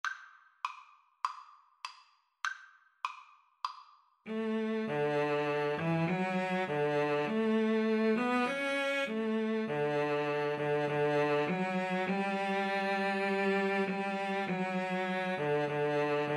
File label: Violin Cello Duet; auld lang syne